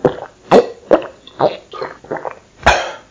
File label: drinking male